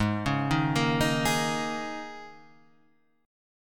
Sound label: G sharp Suspended 2nd Suspended 4th, G sharp; G#sus2sus4 Chord {4 4 1 3 4 4} arpeggiated